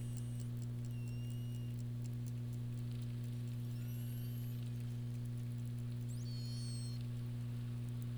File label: Globicephala melas